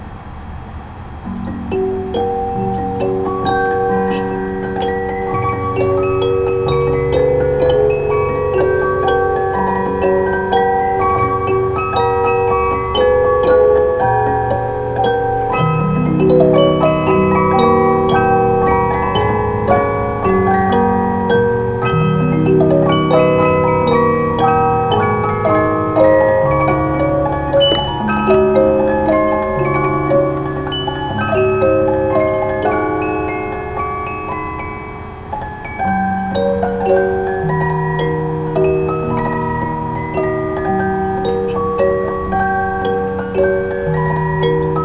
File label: Waltz